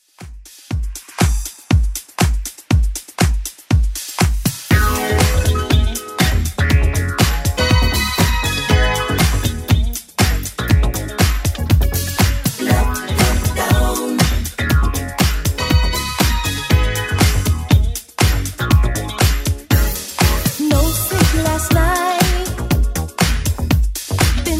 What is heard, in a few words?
80s Club 120bpm